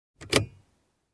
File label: Unlocking Sound